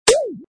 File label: Monitor Destroyed